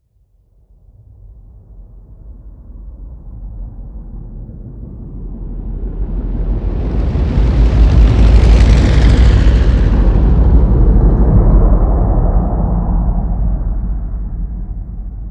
flyby

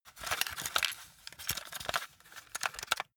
cassette rattle long

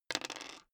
dice roll table

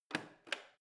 plastic button creak press

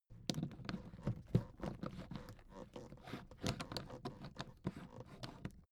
suitcase handle handling

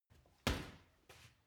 punching bag kick medium

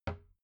cabinet door close soft

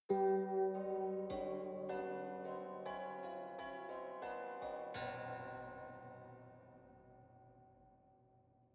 haunted strings chromatic sequence

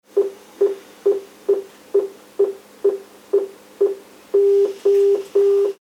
beeping warning

